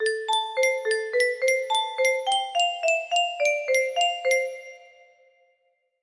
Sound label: very short loop, music box